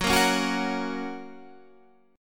F sharp Major F sharp; strummed; 14,13,11,11,11,14; JGuitar

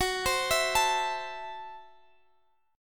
F sharp Minor 7th Flat 5th, Guitar Standard; arpeggiated; capo 2; x,21,22,21,22,x

